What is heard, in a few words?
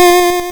Hard PCM Chip; chiptune